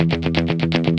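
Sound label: GUI 120, guitar; Best Service Gigapack 2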